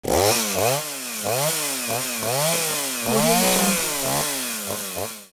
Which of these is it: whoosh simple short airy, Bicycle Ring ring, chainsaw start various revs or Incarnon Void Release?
chainsaw start various revs